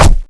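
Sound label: xbow hit body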